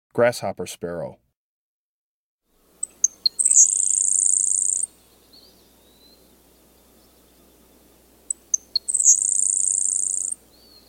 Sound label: Grasshopper Sparrow; Breeding Birds of the Chicago Region